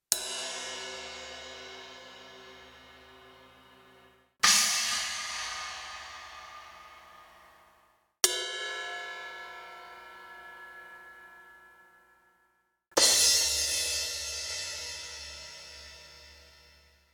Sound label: CYMBALS